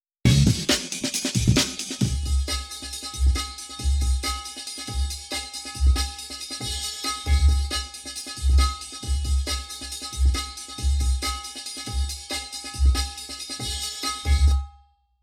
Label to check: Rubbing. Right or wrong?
Wrong